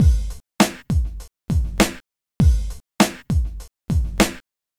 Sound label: Smooth, R&B Drums